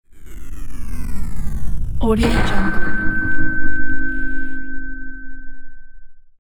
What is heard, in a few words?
cinematic metal hit